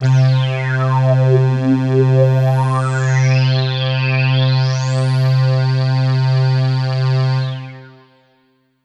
Kawai K5000W Rezzo Pad C3

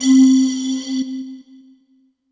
Kawai K5000W Calliope C4

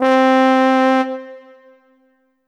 Kawai K5000W Trombone C4

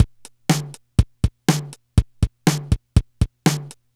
Yamaha PSS-280 Hard Rock

